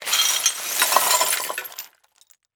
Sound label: Crash Glass Pouring Onto Concrete; crashes; AdobeCC